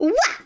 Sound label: ground pound wah; birdo; SM64 CO-OP